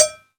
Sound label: Cowbell; Ableton Live 12 Lite; Core Library